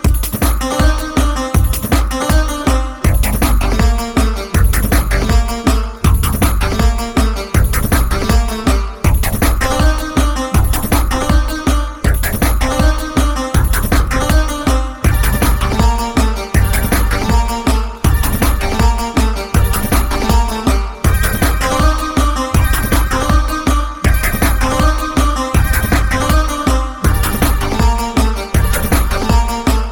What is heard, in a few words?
instrumental running workout 160